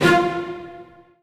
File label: STRINGS F5; EdgeSounds; Drum Mashines VOL-1; MARC STRINGS